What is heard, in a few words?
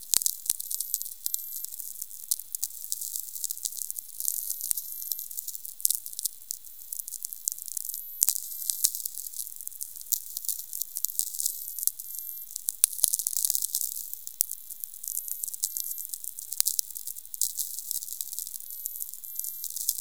VLF